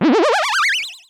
miss, anime

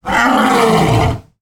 african lion roar

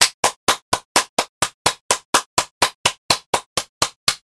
one person clapping

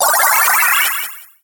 condition restore magic

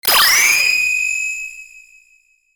flash of light

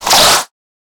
swinging a katana

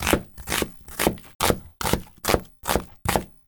chopping onions with knife

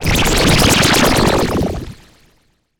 poison magic, battle

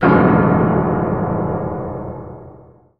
receiving a shock

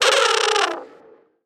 bird monster cry

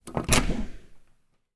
opening bathroom door

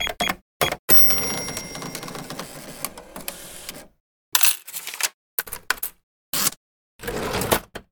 cash register flow

